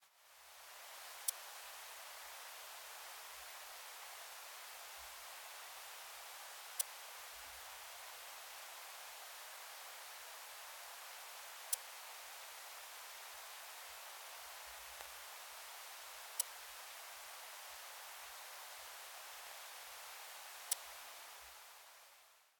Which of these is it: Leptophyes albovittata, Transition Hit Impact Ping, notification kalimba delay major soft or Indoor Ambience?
Leptophyes albovittata